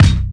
shiny kick single hit b key, kick drum tuned to the B note